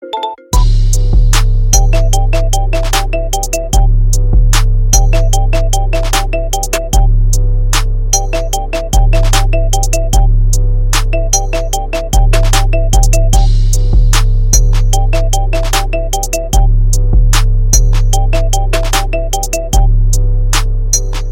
iPhone XR ringtone

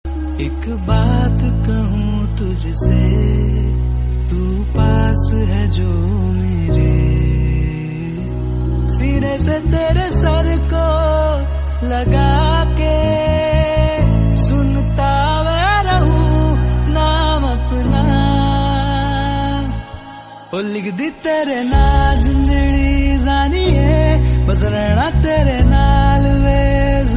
Hindi latest Ringtone

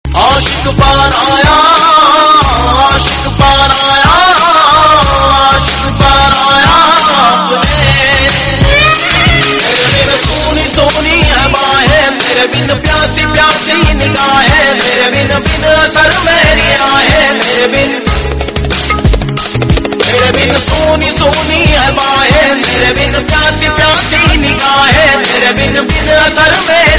Hindi Love Ringtone

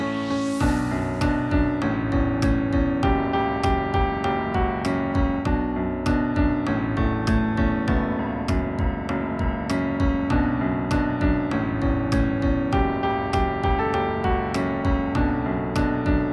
English Melody Ringtone

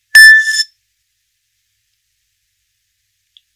Nokia Sms; 2.3

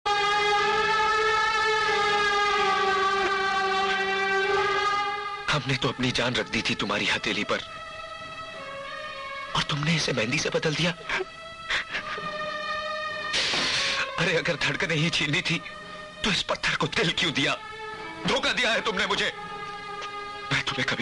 Hindi movies Ringtone

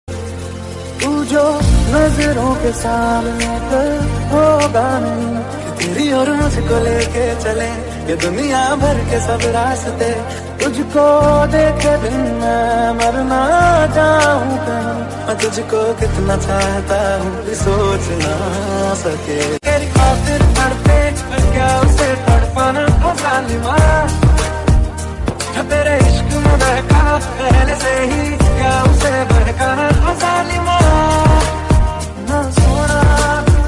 Hindi Ringtone

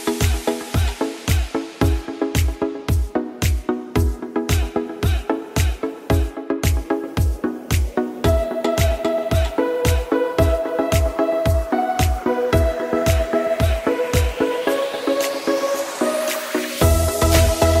Vivo Ringtone

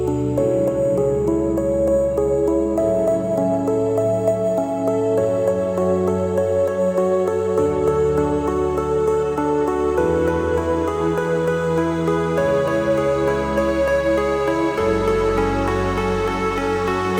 Poco M5 Xiaomi ringtone; (India)

